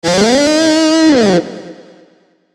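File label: Musical Element, Drum Snare March; Blastwave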